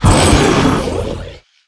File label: Elemental devishdance effect